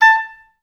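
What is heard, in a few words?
oboes staccato A4, orchestra; OBS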